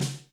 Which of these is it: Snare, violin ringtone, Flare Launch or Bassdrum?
Snare